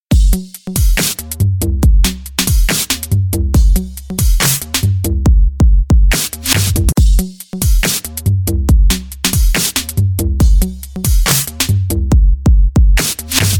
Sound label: jingle; rustag; owf 2013